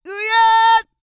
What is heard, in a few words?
SING, World Voices, CARIBBEAN